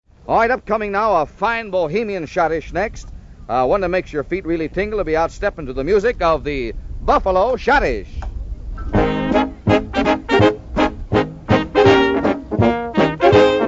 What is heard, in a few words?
schottische